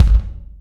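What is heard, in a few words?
bass drum, left; Zero-G - Chemical Beats; BASSDRUM ZOO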